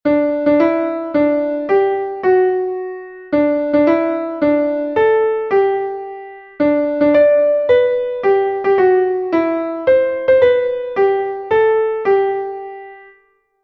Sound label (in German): klavier; zum geburtstag viel glueck happy birthday to you; melodiemeister